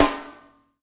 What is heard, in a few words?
FUNK SNR; Spectrasonics - Burning Grooves CD2